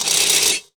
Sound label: CYBER KIT, DRUMS